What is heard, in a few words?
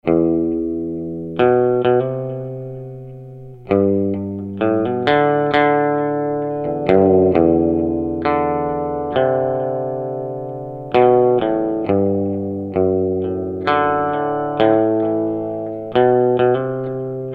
electric guitar, omni; lct 640 ts; lewitt